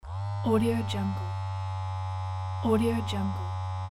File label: phone vibration long